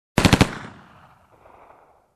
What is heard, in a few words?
Machine gun firing short